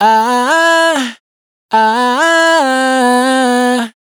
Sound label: riff ah 140 C, Vocals, Dry Vocals; Raw Vocals; Slate Digital Omnivox Vocal Sample Pack